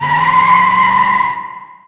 auto svolta

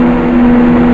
Veicolo, motori

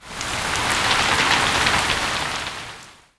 Acqua, natura

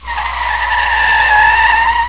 Frenata, motori

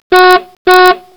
Clackson, motori

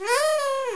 porta apertura

